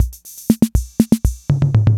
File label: INTROS FILL, DRUMS PERC YAMAHA; Rubber Chicken Software Sample Bank